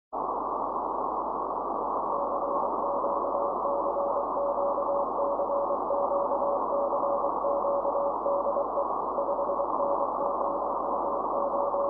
cw, 6m

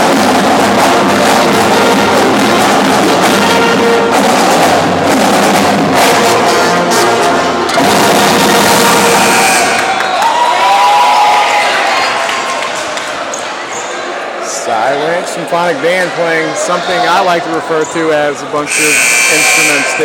symphonic band; y ranch

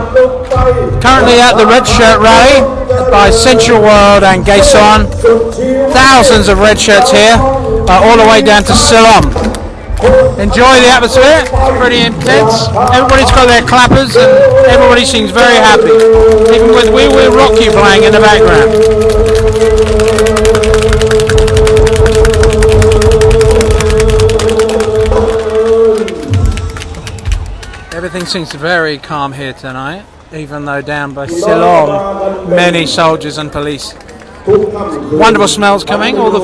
red shirt rally, Bangkok

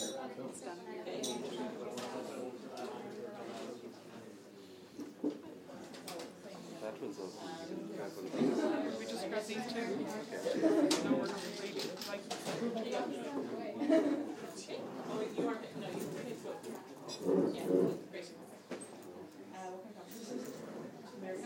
stormy teacup ambience

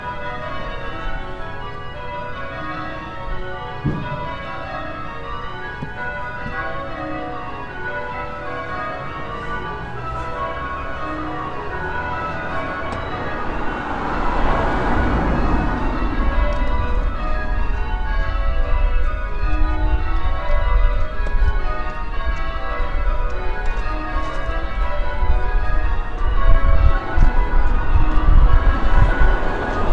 old st martin s bells

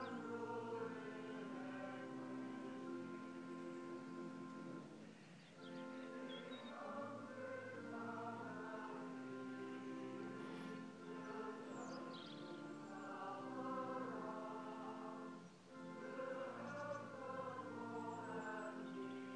Sunday morning church singing

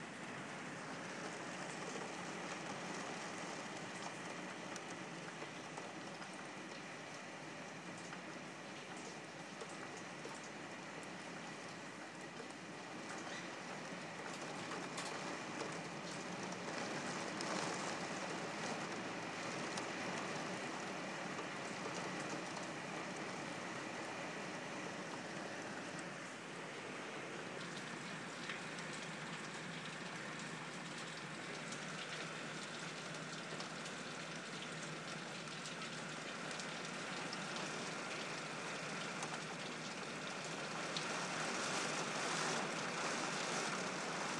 rain against window

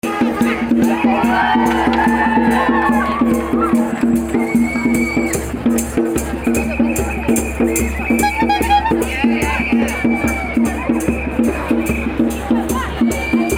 chants; keep the ban; fight for the fox protests

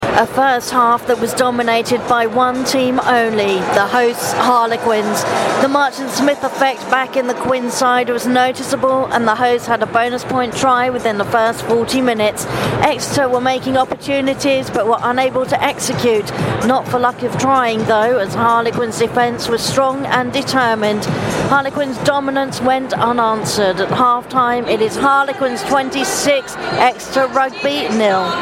half time at twickenham stadium rugby; harlequins 26 0 exeter

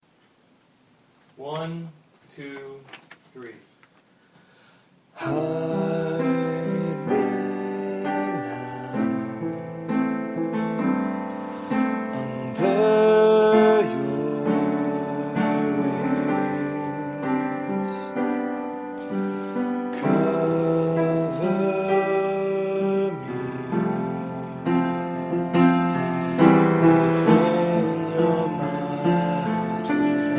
me playing piano and singing; iphone's fourtrack app

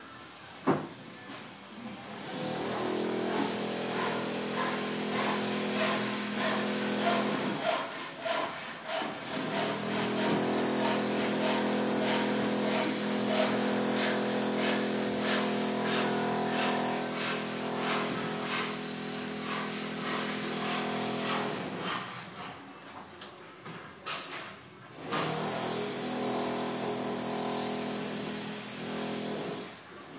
the sound of drills hammers and saws; from the gbgmagazine office we re living in a building

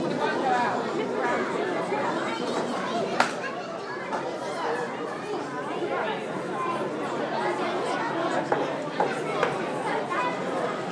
launch pad explosion

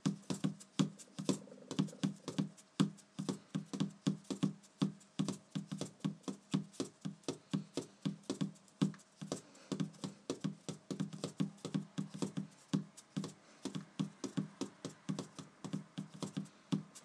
tapping out a beat on my mouse pad

